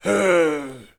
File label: ogre die; wesnoth